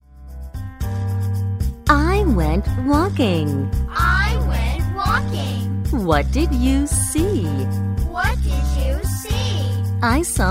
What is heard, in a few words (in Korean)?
영어 여 챈트